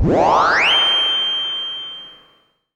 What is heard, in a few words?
Simple OSC Spring Reverb